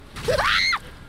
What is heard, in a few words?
loudest scream she did; IRyScream